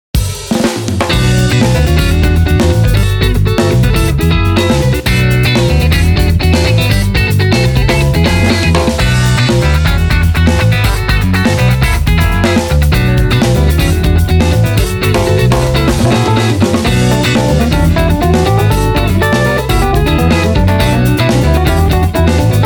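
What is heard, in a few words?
guitar test old pickup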